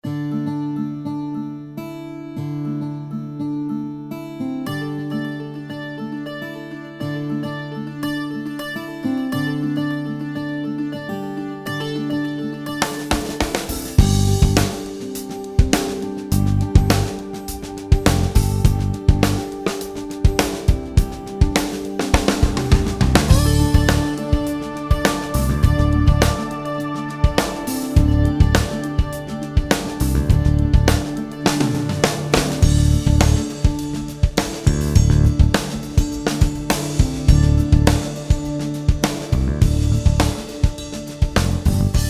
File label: Backbeat 100; Goin On